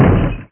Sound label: LOCK